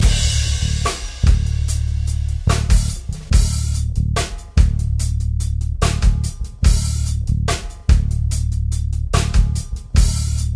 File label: Dmaj7 rock; scales Gmaj